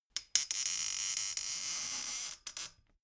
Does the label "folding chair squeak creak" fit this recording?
Yes